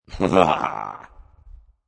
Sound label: Evil Chuckle; Evil Laughs (Sound Effects:Horror)